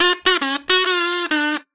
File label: kazoo funny motif